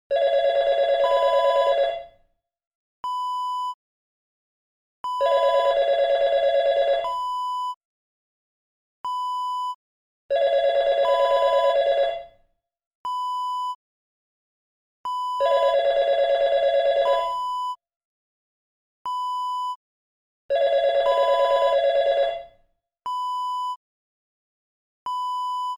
telephone rings

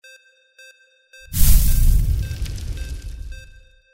SCIFI FIRE FLAME; windy beach audio

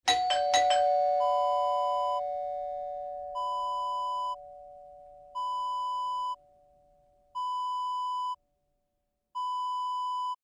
doorbell

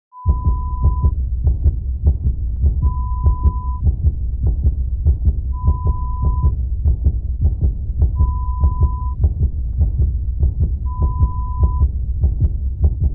Heartbeat sound

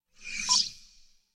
Short Whoosh Wisp

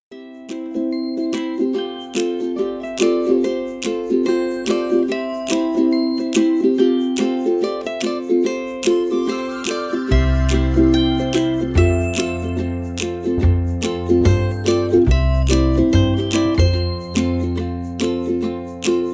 Chillin Ukulele 72bpm

